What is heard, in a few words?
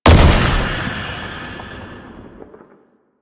Unyielding Gallop Hit; Warframe; Dagath